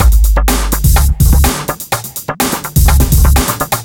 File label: Dirt